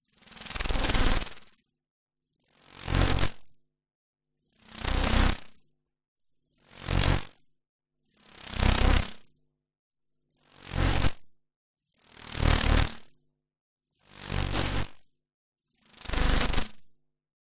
Cnc Wood Carving Machine; soundbox library

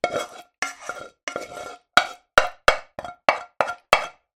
food pasta scrape from pan with wooden spoon; sound effects one

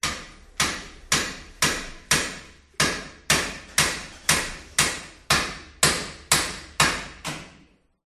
hammering hard a bodywork garage ambience; Sound Ex Machina

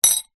household teaspoon drop in bowl

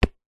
foley footstep single boys sneaker on concrete

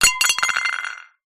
impacts plate dish ceramic drop ground no smash

